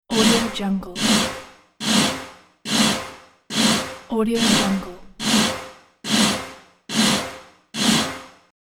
steampunk cartoon animation machine movement industry